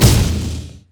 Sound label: dragons rage; LoL; leesin; blindmonk